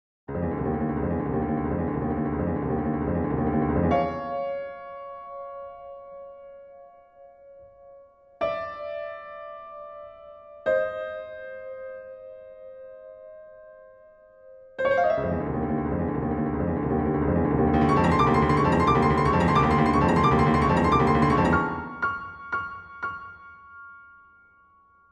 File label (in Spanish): piezas para piano; 5 piezas para piano